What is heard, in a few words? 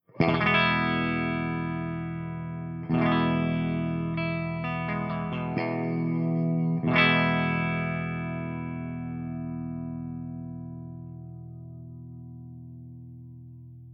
ENGL SP CH1 CLEAN BRIGHT REV V30 HB